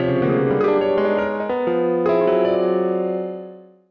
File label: piano; ゆめ2っき